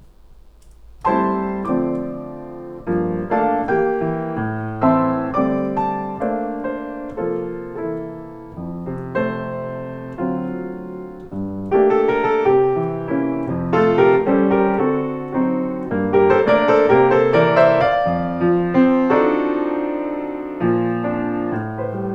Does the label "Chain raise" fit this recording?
No